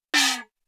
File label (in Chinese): 中虎 演奏技巧 眼击